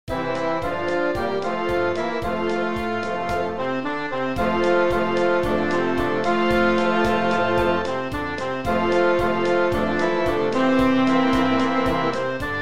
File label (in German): Marsch